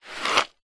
household jewelry in wooden box tip slide lid closed; zapsplat